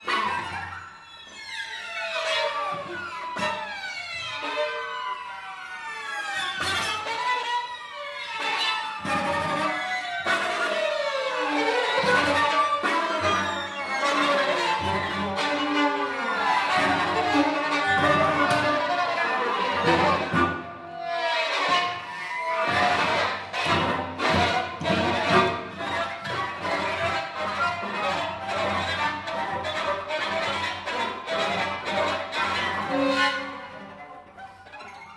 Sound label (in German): Streichquartett